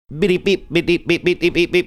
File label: making teletype sounds